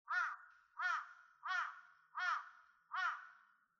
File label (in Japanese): hashibutogarasu nakigoe